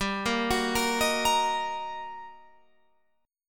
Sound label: G Minor Major 7th Sharp 5th, G, down, Guitar, Open F; GmM7#5; arpeggiated